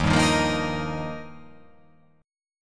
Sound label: D sharp Major Add 9th